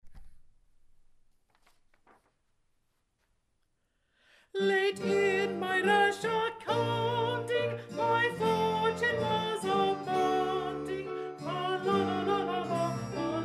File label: Late in my Rash SLOWER Alto Sop; Late in My Rash Accounting-Slow, T. Weelkes